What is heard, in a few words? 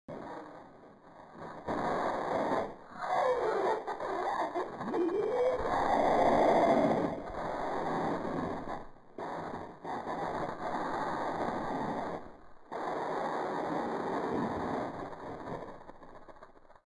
glitched laugh